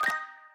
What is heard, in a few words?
Block Match; In Block Match04